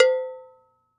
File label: Handbell, World Percussion; Cymatics; PHARAOH Beta Pack; Pharaoh Premium Drum Samples (BETA)